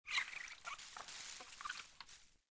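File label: ruins ambience; poolofradiance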